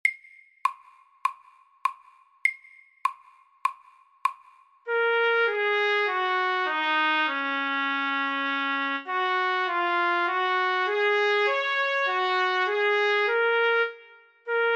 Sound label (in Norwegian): Lyrisk etyde i Ass dur MIDDELS